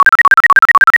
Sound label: tone; cuddle n care